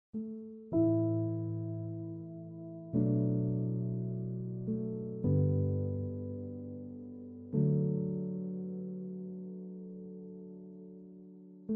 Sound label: Reflective Piano; her story background tracks